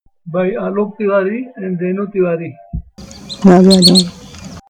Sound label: Grey headed Lapwing; Alok